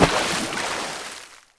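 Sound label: land water; ambient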